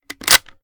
sm reload fast openbolt; gmod

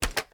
reload fast end, weapons; xmag; gmod; viper

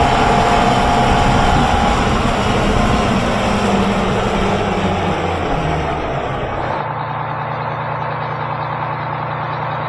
apc slowdown; vehicles

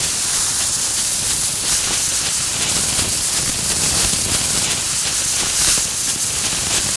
smoke grenade smoke loop; gmod; viper

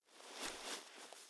foley, mp5; weapons; gmod; kryceks swep; navaro mp5

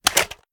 reload drum drumout; viper

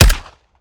sup plr; weapons; gmod; viper; scharlie

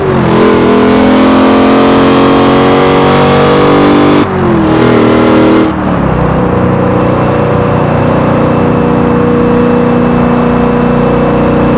fourth cruise, vehicles; camarozl1

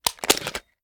reload magout; gmod

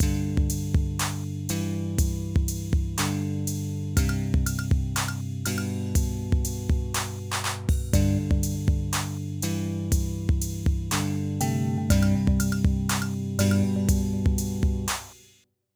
band practice; stardew valley ost

